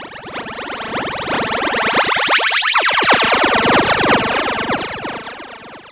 space bubble laser; Electro and Synthetic

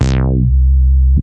fat res down, Basses

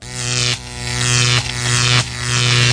electric arc; Electro and Synthetic

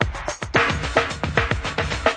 thick echoes, drum loops and breaks, 110 bpm; 101 to 110 bpm

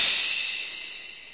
CYMBAL, BeatBox Female; voxy

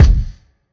thud kick; Hip Hop Specialty Kit; STYLE KITS (Hip Hop, Etc)

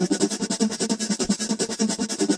rubbing shakey 16ths, 101 bpm; 101 to 110 bpm

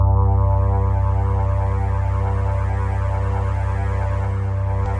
ADD Synth C1, Many Harmonics Synth

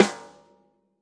natural sd rim on; single hits

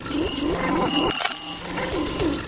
panning perception; LOOPING AMBIENCE

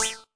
cyborg Buzz; Cyborg Kit

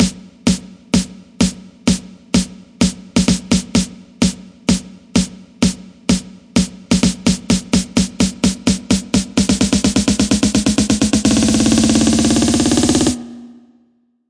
128 bpm bounce snare fill climb

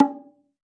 bongo; Eight Bongos; ETHNIC and WORLD PERCUSSION

